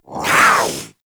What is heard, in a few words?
Kavat Vocal Attack Short